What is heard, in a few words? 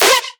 synth hit hype yell, C; Virtual Riot